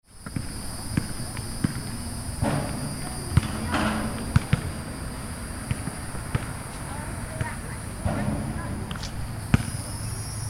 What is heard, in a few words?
Suburban Park